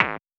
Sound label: kick